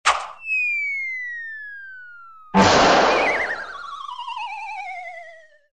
svist pri padenii zvezdy nad golovoy

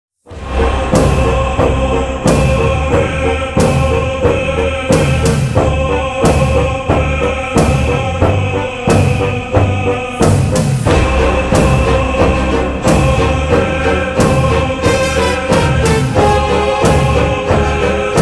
strashmuz

